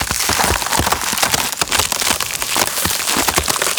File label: Trolley Ice Buildup; Ambience; Gameplay; Warframe; Corpus Railjack; Comet Shredder